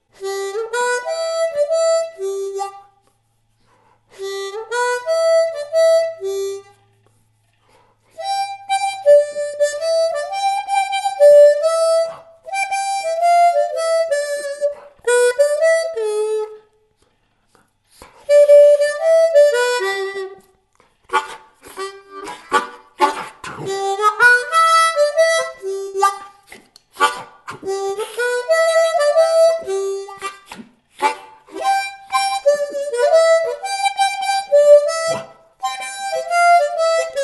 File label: Acoustic Jam, harmonica